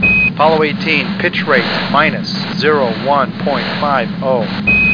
PITCH

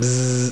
bzz; Old MacDonald

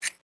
BUTTON

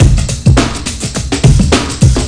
hypnotone 104bpm

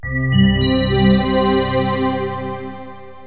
Build

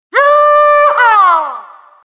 yeehaaa

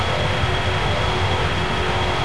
Visit big Fanjet; A-10 Attack! Cuba Demo

